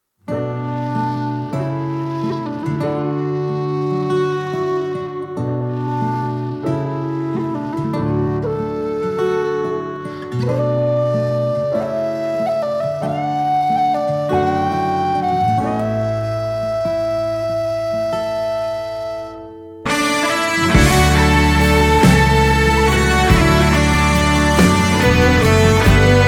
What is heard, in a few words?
backing track